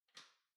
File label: Light Switch American Toggle Far Hall Gentle